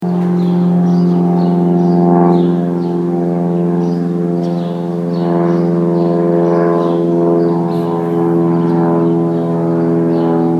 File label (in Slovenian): letalo